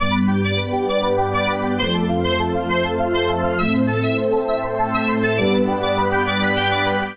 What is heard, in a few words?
Pad (G) 134bpm; Music Loops; Tropos Pad; Ableton; Factory Packs; Singularities